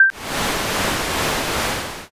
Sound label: aircon